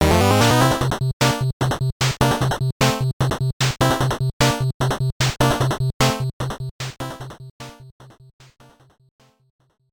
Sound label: Stage Clear; metal storm; nes